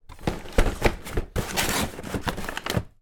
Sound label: card box being pushed squeezed into drawer